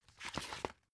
dvd case put in booklet

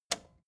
record player repeat push

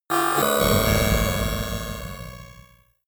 horror orchestra short

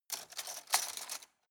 cutlery plastic drawer rattle

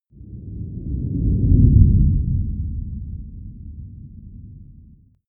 whoosh low slow

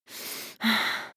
breath female highly aroused

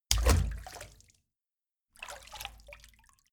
walk foot in out

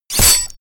sword impact gore

